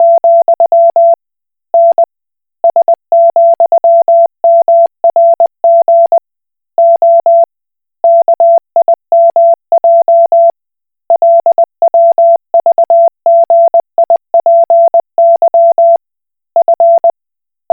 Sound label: Koch Morse